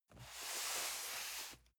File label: cardboard box medium slide soft; objekte